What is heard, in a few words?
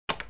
snook pot